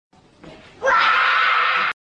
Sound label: wraaaaaaaaa